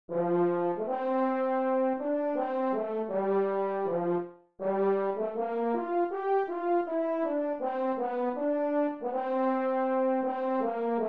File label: French Horn